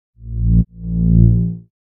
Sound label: Chevron Tone